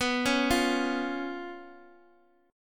B Suspended 2nd Flat 5th B Guitar Half Step Down, chord